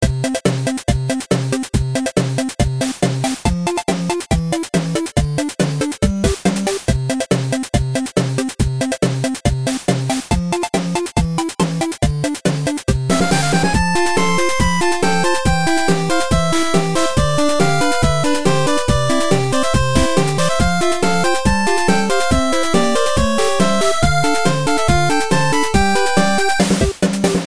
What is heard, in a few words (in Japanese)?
テーマパーク